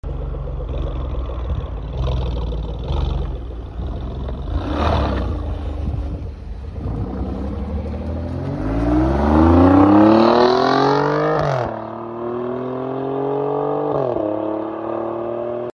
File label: Alfa Romeo exhaust